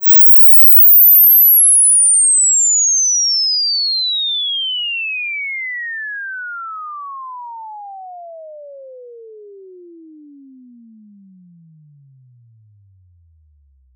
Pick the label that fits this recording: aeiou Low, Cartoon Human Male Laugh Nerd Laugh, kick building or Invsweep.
Invsweep